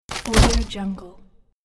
tree breaking; juicycorp

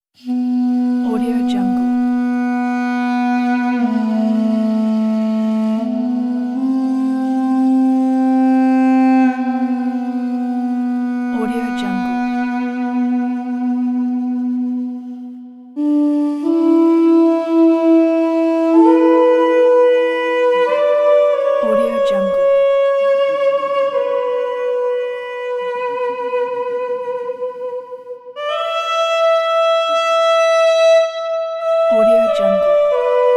duduk flute solo

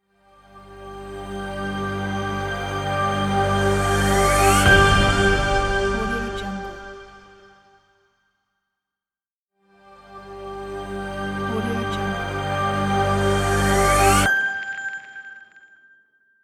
digital logo reveal

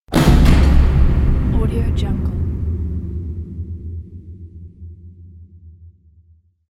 it is exploding

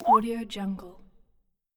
cartoon water drop; nbkstudio

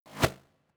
crossbow arrow flyby; future weapons 3; crossbow arrow type 3; soundmorph

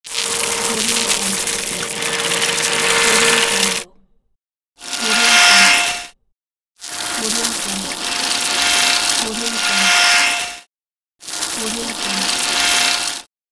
shredder

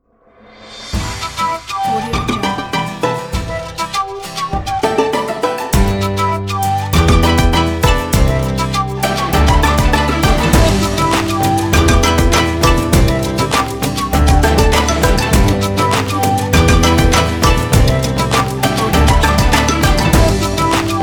happy japan intro logo

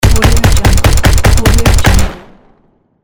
heavy duty machine gun shots; prowaveaudio

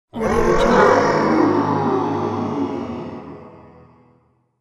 summoning warriors spell

